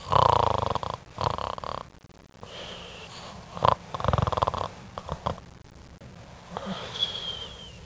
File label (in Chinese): 呼噜; 日常生活; 特酷声音素材库